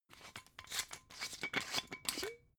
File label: glass bottle massive open scratch